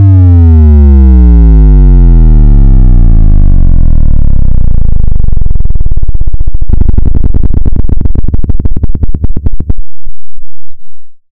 bass treble

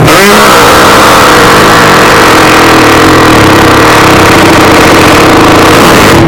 loud ahh fard

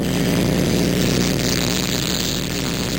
shocking

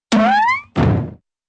high twang and bodyfall

